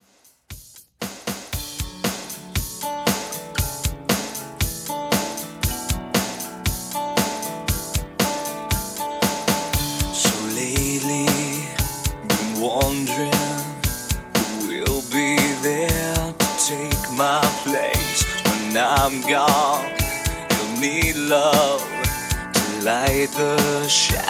Alternative Rock Re Drum 117 BPM

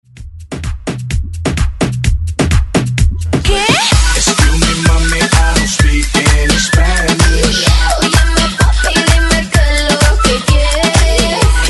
Latin ReDrum 128 Bpm; DJDOLLS

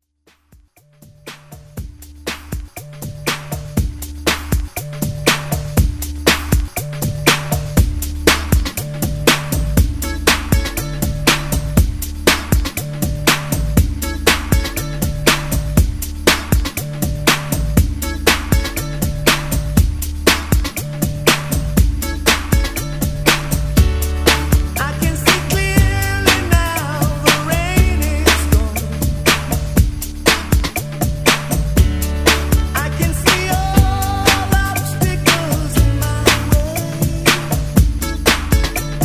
90s Underground Redrum

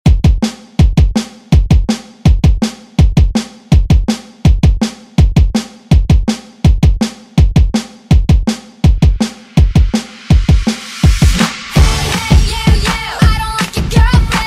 Rock Redrum; Deejay Hit

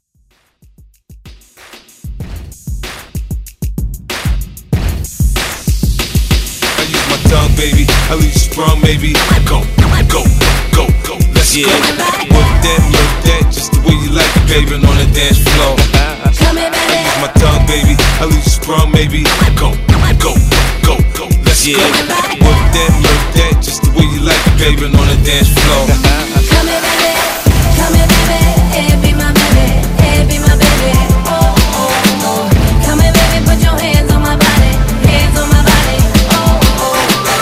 Throwback Tipsy Redrum

